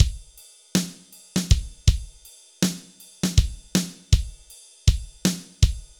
BPM 80 AMB; AKAI S-Series CD-ROM Sound Library VOL-4

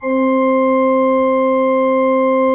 C3, Cathedral Organ; Propeller Island; ITAL.PRINZIP

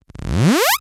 Scratching FX

